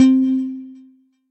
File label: Synth Keys, Stabs; Godfather